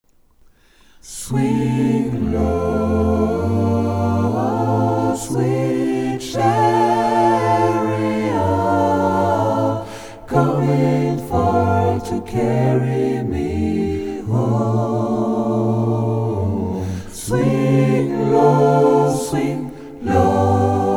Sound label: intro bas, Gospel; swing low